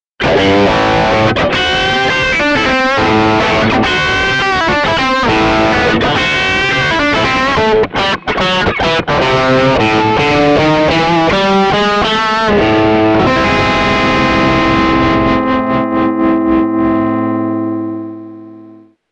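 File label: Squier Przester M, classic strat humbucker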